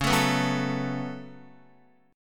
Db 7th Suspended 4th Sharp 5th Db down Guitar; JGuitar

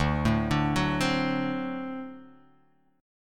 D 7th D down

